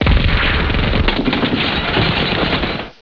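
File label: GATLING GUN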